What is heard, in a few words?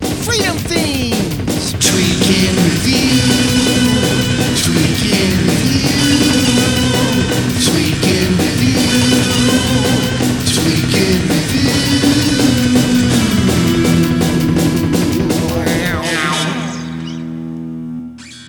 Jingle; Freedom Feens; Tweak in Review